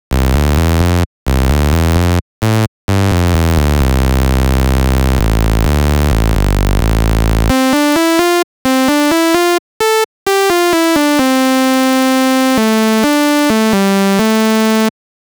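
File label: saw synthesizer; flstudio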